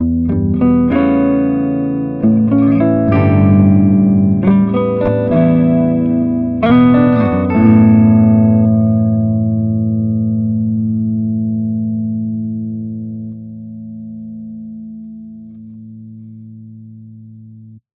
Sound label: GT Riff